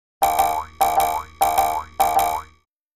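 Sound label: Boings With Twang Boings; boings / twangs / snaps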